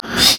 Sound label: wraith effort; LoL; monsters